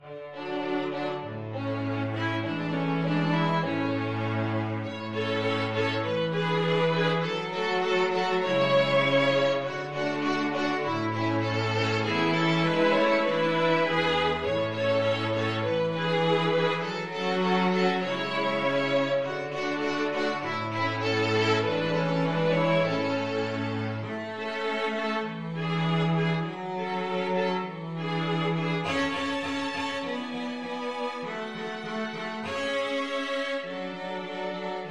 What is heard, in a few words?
string quartet